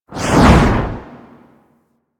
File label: Arch Wing Dodge Roll Left Low, Vehicles; Movement; Warframe